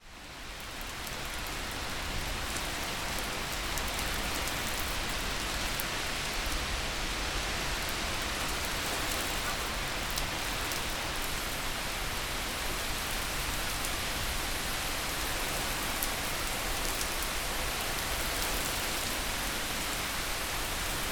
Rife Frequencies, Relaxing Rain Sounds

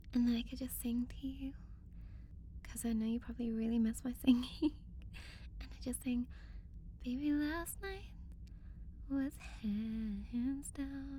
Singing; Space Log

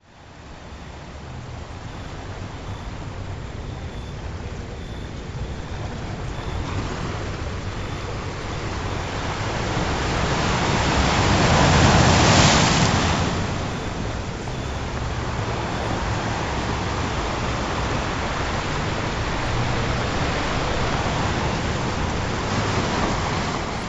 Relaxing Ocean Sounds

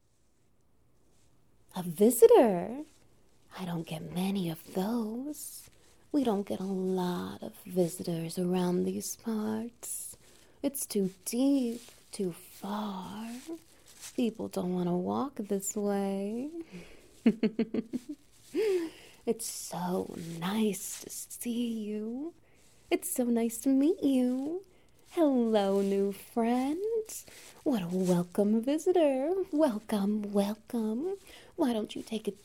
SFX Wet Noises

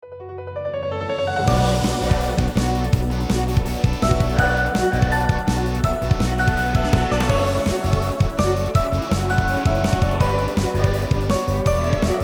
SKA Sketch

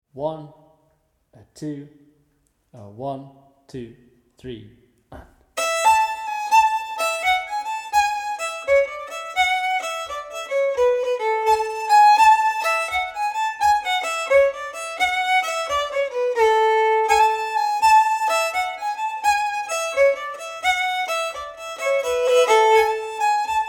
Fiddle Steady; Grimstock

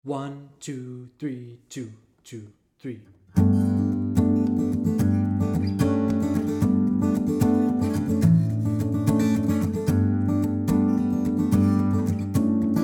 The Bonny Miller Guitar Full Speed

